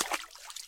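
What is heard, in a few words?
quiet weak splash from a small fish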